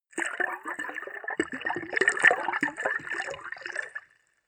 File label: bubble and splash